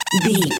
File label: voice fun creature squirrel